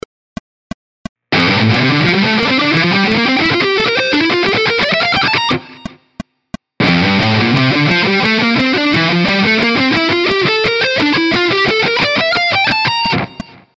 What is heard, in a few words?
ascending shred